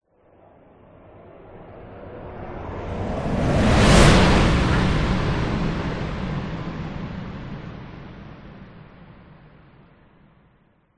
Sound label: whoosh; orlando lanzini